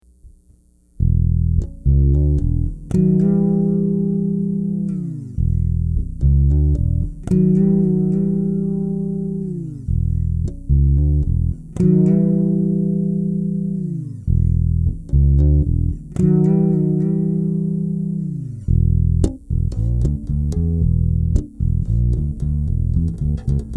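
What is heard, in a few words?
pickup pan, four string fretless semi hollow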